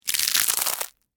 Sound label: Texture, Crack, Crunch, Ice, Cream, Cone; Food; Household